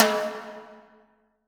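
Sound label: High TIMBALE; JV-1080; EdgeSounds; Drum Mashines; JV-1080 DRMS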